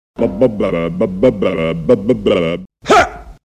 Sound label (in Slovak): nahnevany sms, ring; zvonenia